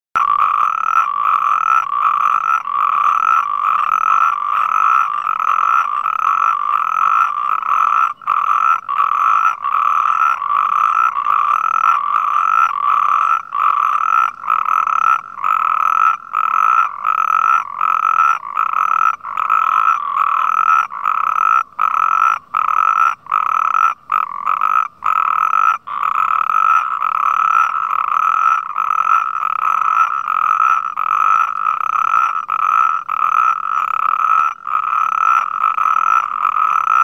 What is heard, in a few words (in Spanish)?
Sapo corredor Epidalea calamita